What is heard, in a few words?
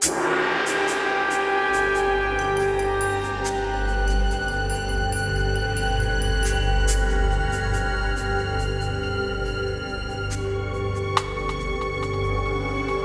Stealth START